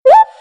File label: Bubble Burst